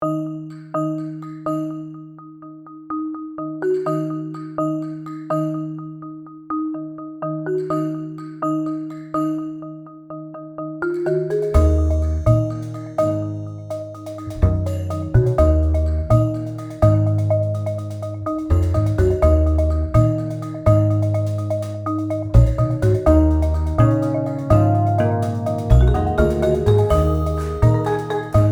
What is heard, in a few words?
Marimba; TAL Marimba Demo